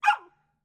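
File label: dachshund, dog barking; taksa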